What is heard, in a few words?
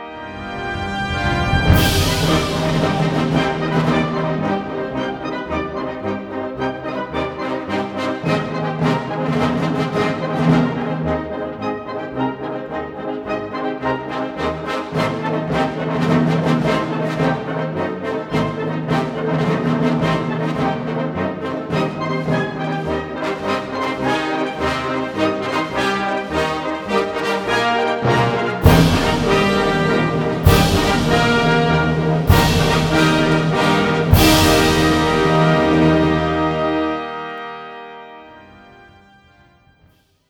scores Epic Music; bombsquad